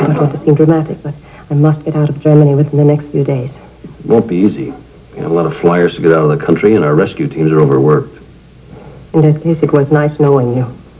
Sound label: dramatic; "I don't mean to seem dramatic, but I must get out of Germany within the next few days."